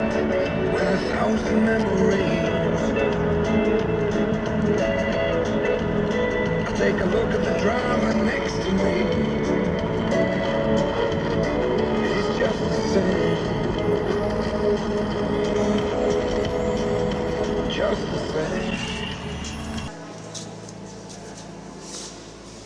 canterbury cathedral

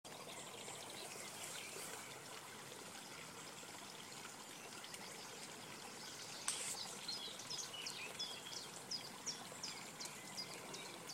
nature sounds

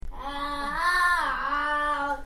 singing

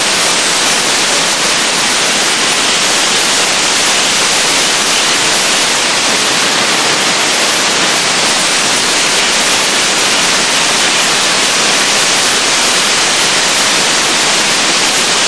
blowing down a pea harvester with compressed air

